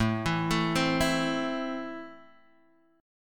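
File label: A Minor, chord strum; x,0,2,2,1,0; JGuitar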